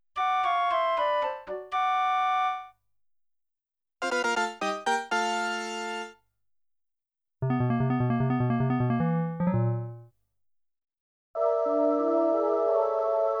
ME Collection (Waking Up~Level Up~Curse~Dispelled Curse~Game Over~Save~King's Treasure; Torneko no Daibouken